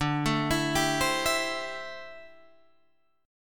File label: D 7th Suspended 2nd Suspended 4th D, Guitar DADGAD; D7sus2sus4; arpeggiated; 12,12,14,12,15,14